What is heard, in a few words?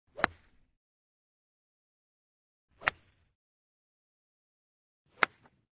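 Golf club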